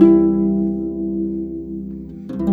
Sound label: Harp, Orchestral Series Rock and Pop; Sonic Foundry (Sony Creative Software); Orchestral Series VOL-4 - Rock and Pop